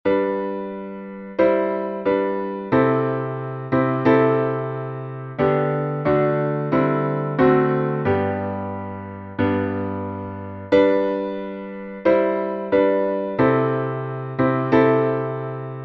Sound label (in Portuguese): salmo instrumental